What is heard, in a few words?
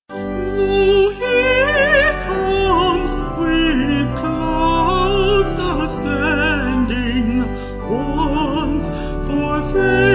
Lo He Comes Vocals Organ